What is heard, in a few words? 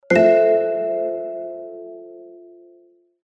Lower Email; HTC; Motif; Sound Sets